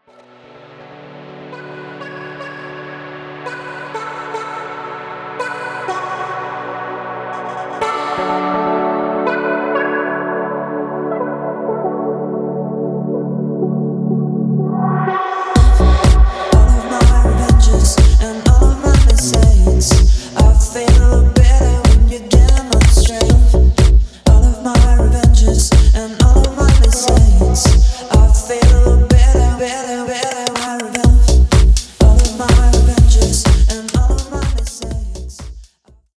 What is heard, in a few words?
Techno; Brest